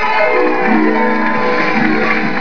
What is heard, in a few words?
open